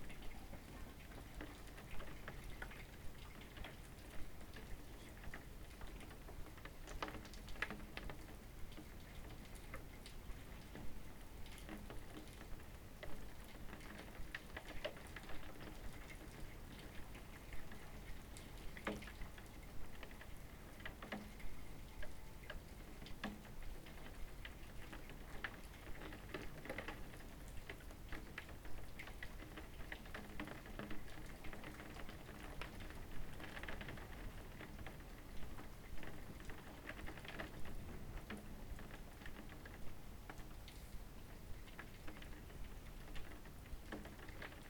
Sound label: rain, raining over the window; ambience; NS Studios - ultra sound collection